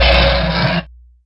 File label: mutant pain